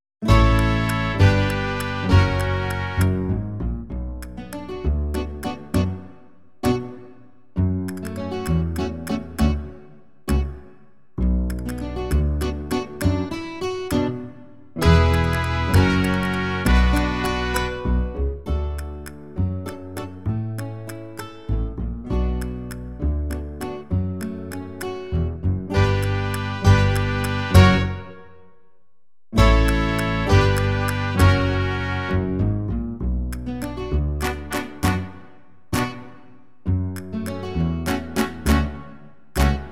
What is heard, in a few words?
fandango backing track